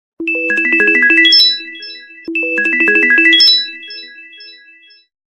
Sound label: Twinkling bell; Samsung; GT-C6712 Star II Duos